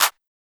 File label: Clap, Hip Hop Drums - One Shots; Cymatics - 9God Hip Hop Sample Pack